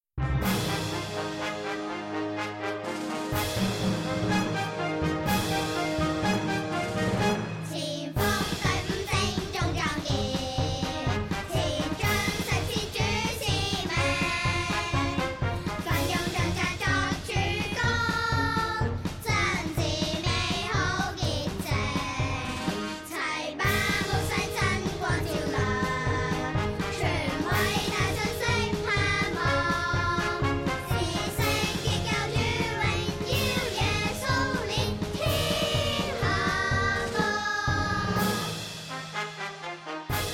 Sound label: Pathfinder song chinese